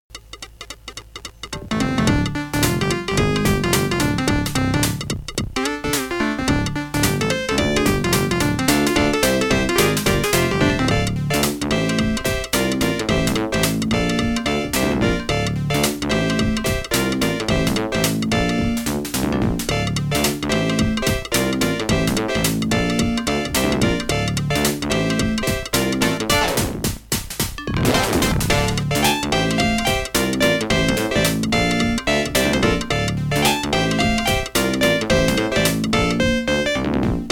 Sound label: toy adlib; lost vikings